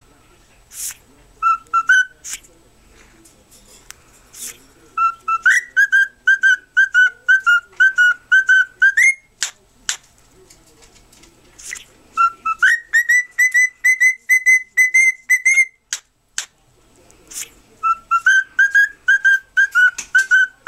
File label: Cockatiel sings sound; birds